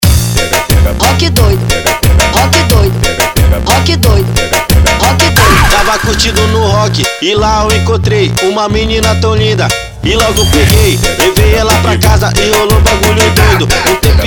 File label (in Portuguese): LOOP ROCK DOIDO